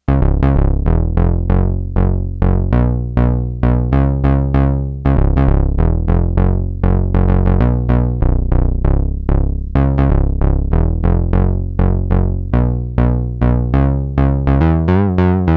FUNKY BASS, Roland SH-101; Factory Patches

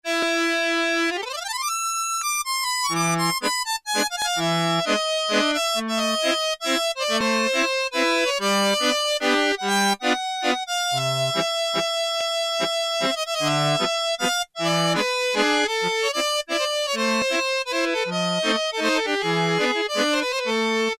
Squeezebox, Yamaha TX81Z

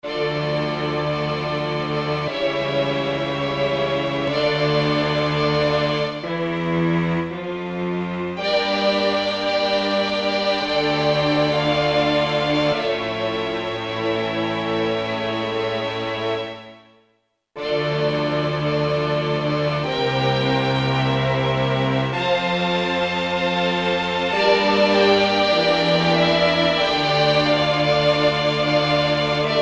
strings; Guess the sampler game

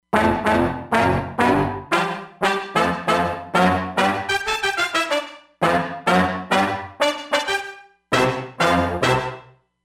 Brass, Roland Fantom XR; Brass Ditt

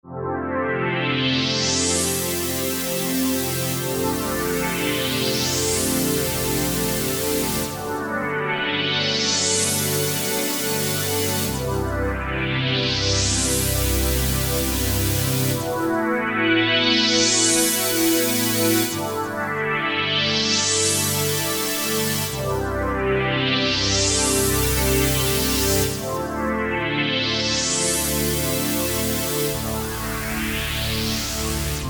E-mu Proteus VX Pulsation

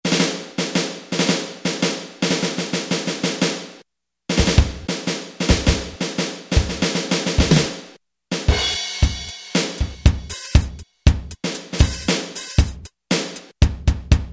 Drums, Roland U-110; Factory Patch

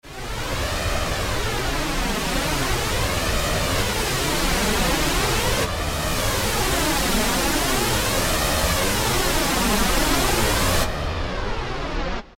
FLANGER NZ, Roland SC-880; Factory Patches

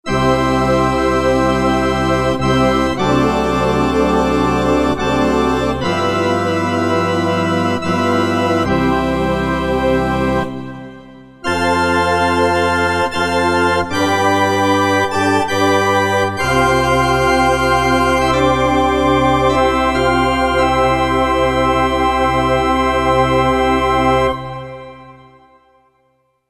Pipe & Choir; Kurzweil K2000 V3

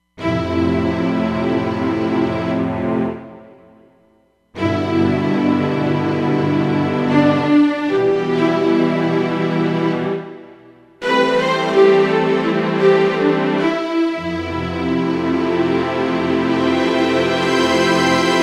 Full Strings, Roland Fantom XR

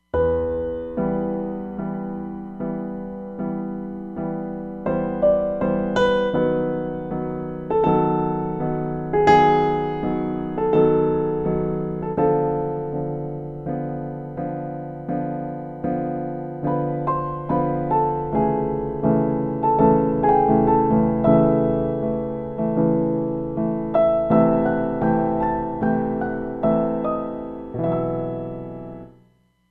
Dark Grand

